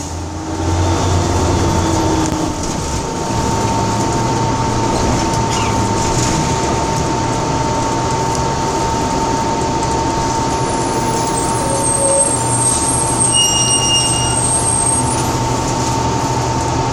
Loud sitting bus

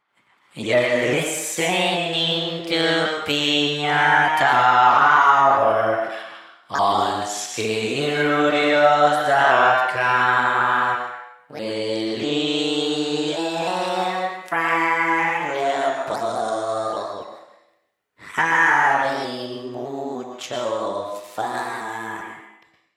intro auto-tune hip hop

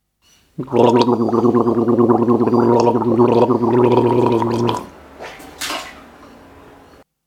Gargling

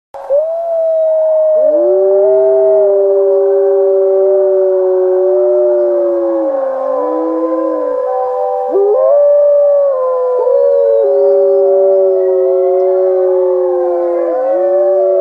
wolfs howling; SKIT

